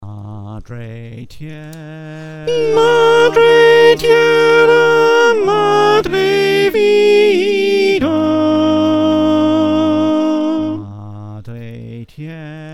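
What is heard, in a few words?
soprano, choir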